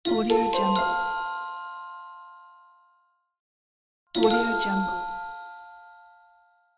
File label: right answer